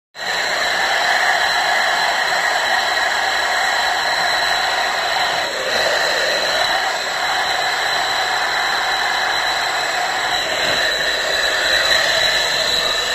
shum racii

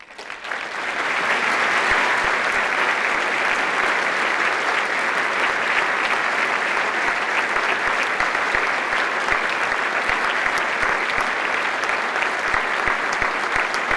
aplodismenty publiki

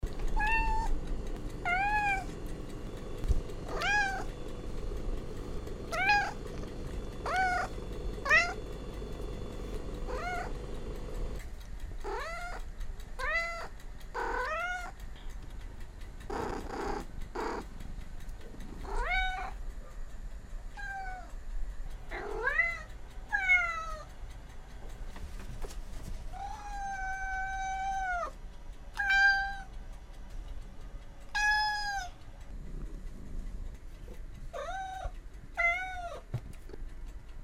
kot - Myaukane

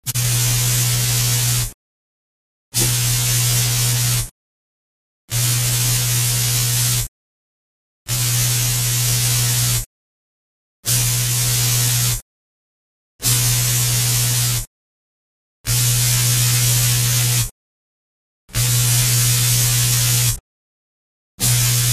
svarka lazerom

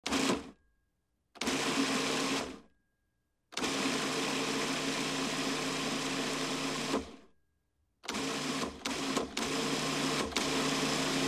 preryvistaja rabota kuhonnogo kombajna

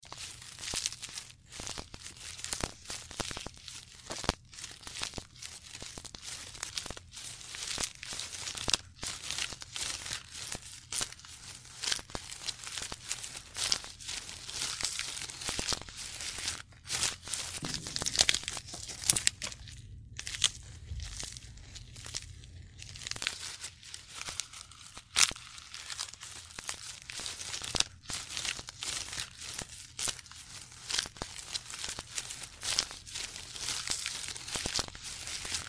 zhiraf est vetki

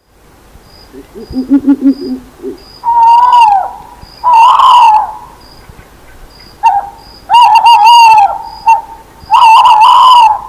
kriki nejasyti

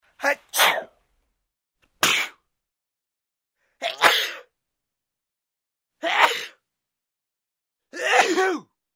chihaet muzhchina

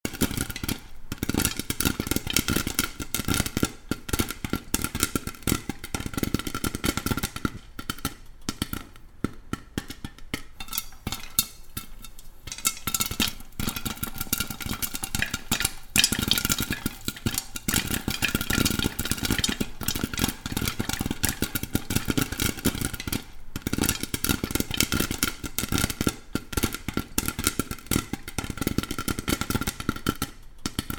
zharjat popkorn i on lopaetsja

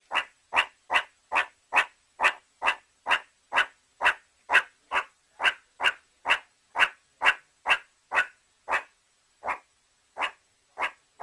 zvuk surikata

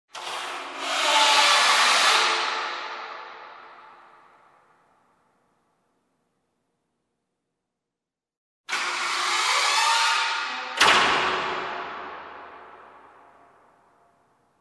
zvuk dveri v bolshom pomeschenii

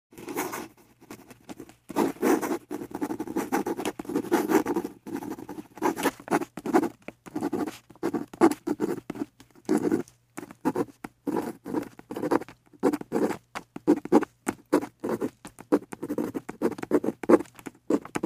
zvuk pisma ruchkoj

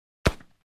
vystrel s glushitelem

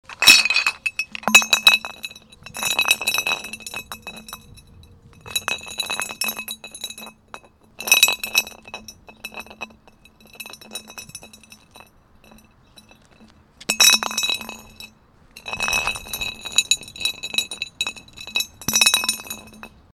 butylka katitsja

